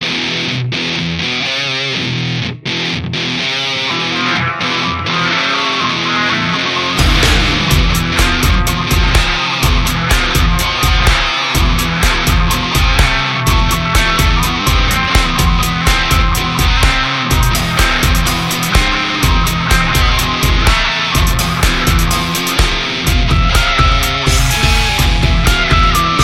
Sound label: Only Music